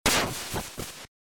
maximum speed land

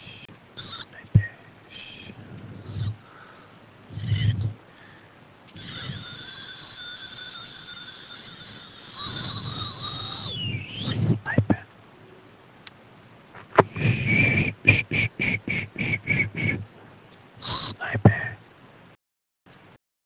sniping

